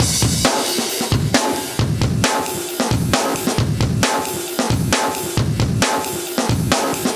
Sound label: 134 Beatz, live breaks